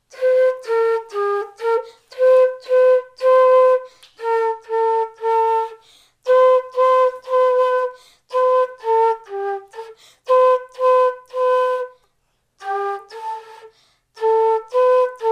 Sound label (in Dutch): dwarsfluit